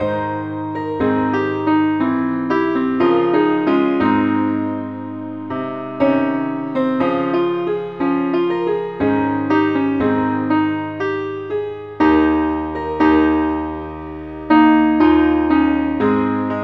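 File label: keyboard Only key D flat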